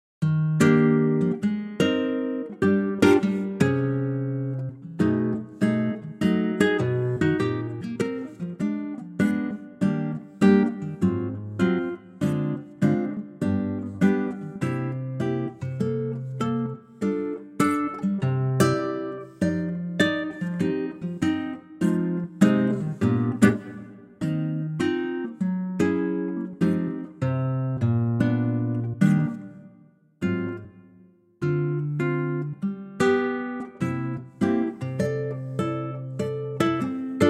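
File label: Eb guitar, male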